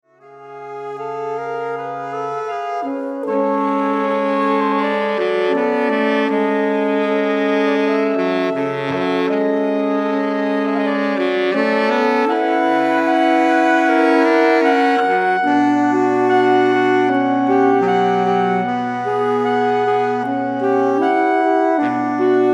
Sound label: quartetto, sax quartet